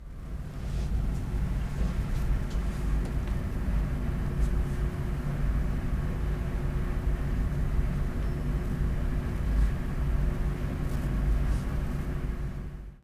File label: Office Ambience; UBC